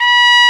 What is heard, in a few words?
TR2 E5, trumpets; East West - Global Sounds